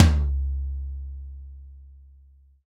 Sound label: hi tom drum single hit c sharp key